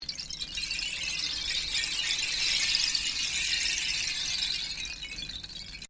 Rainstick Cascade Short, Hand crafted decorative rainstick; Shaker; Musical